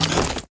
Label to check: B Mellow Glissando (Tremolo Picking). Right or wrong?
Wrong